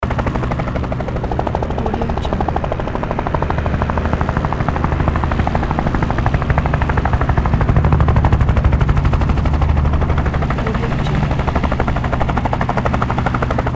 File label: helicopter engine blades loop